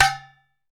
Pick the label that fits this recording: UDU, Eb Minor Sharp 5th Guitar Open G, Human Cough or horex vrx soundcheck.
UDU